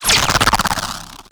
bolt huge zap

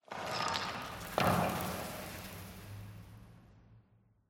tenement concrete debris; ambient, rocks

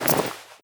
foley step; npc

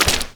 fs fm paint, footsteps; Portal 2

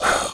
fast out, headcrab breath; reviver

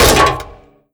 vent step, headcrab reviver, creatures

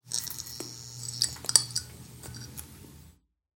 light flicker, ambient lights

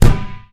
rubber tire impact hard; physics

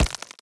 step layer, headcrab reviver, steps, creatures

